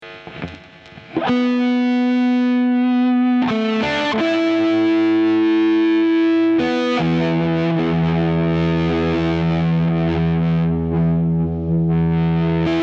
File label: distorted playing